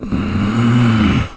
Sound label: Grumble; Renado grumbling at Barnes